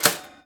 Switching A Rattling Lever With Room Ambience

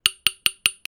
Hammer

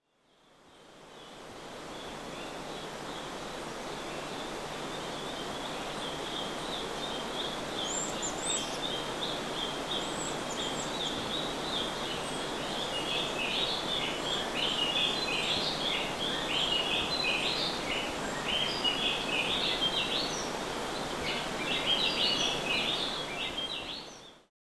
Birds In Rainforest Near Fall

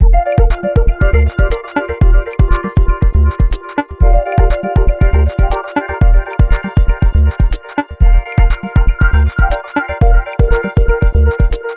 Vocoded Beat

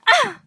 Ah Woman

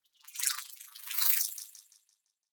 Drippy

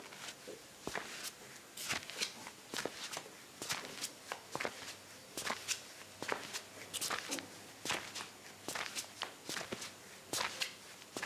Steps Amped

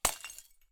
Glass Brake

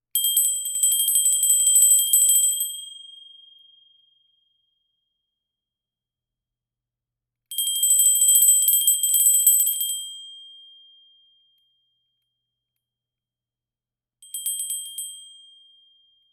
Bell

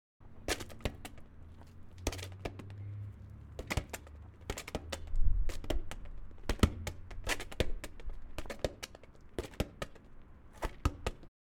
Soccer Ball Against Wall

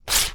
Rip

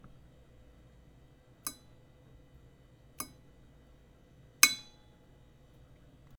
Lamp Ting

